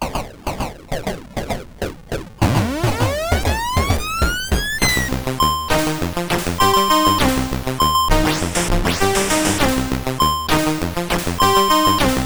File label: Synth, CSG8580R5